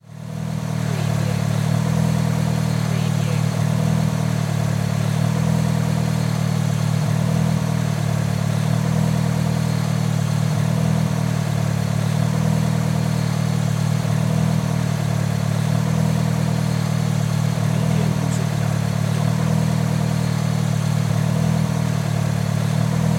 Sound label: MITSUBISHI ECLIPSE ENGINE IDLE